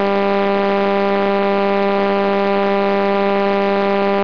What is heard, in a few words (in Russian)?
trubka